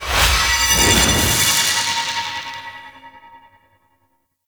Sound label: Locked Chest Unlock Beam; Warframe; Duviri; Ambience